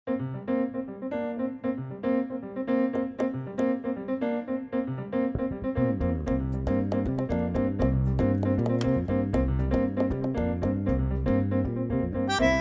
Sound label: Nuevo Tango Ensamble candombe